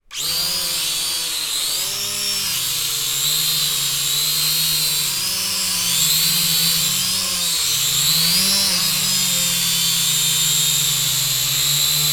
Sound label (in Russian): zvuki kvadrokoptera drona